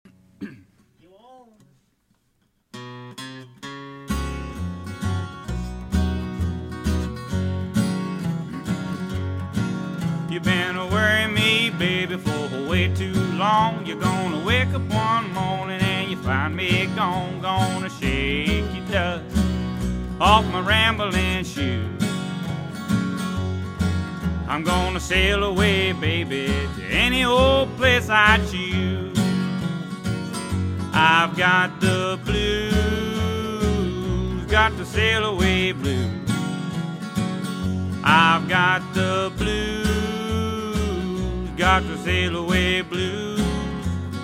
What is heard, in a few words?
Sail Away Blues